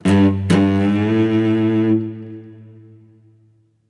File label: Music Level Fight Stinger; Krish